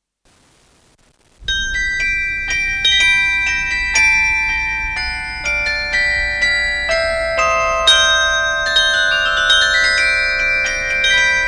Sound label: dutch carillon